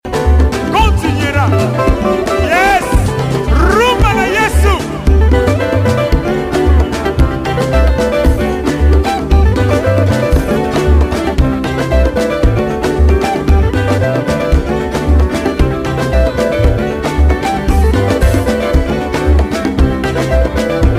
Rhumba

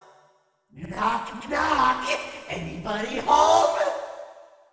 Knock Knoc

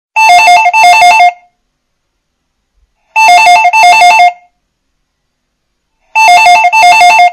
Morden Phone Ringing

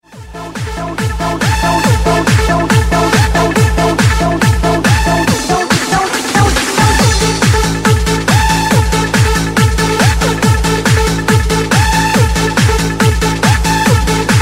Techno Sound

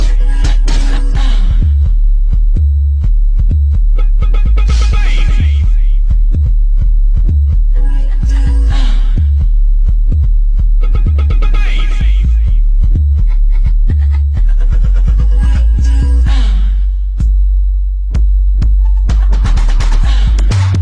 Mega Bass Test

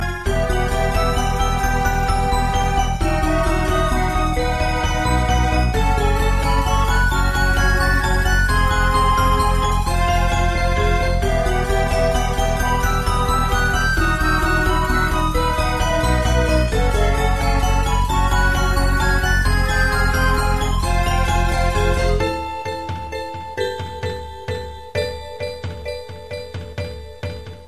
Aqua Rock

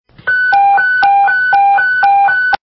Fire Ems Tones

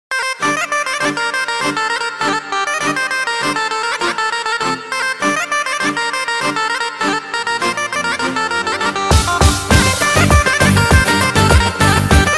Balkan Turbo Folk

